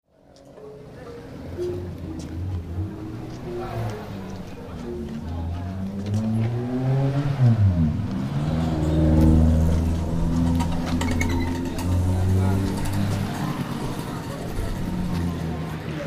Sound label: stereo recording of traffic in Kuta, Bali